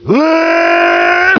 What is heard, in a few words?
door move; svencoop; cracklife